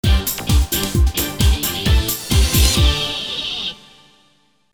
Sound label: Sport Jingle